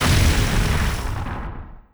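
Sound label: Laser Hit Exterior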